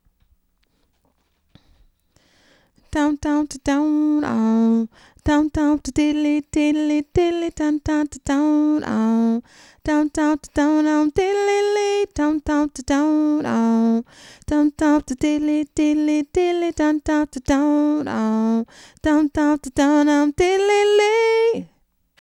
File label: epic diva